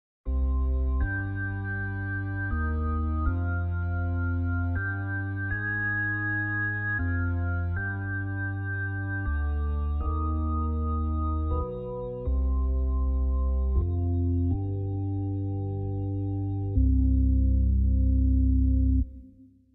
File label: pass organ master; The Best Man